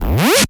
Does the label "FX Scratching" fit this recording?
Yes